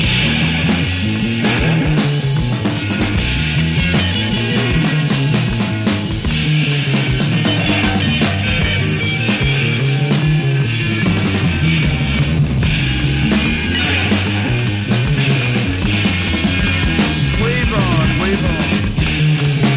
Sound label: ambience red guitar